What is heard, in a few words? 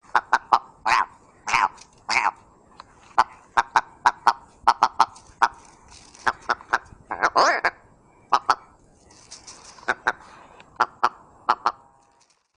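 partridge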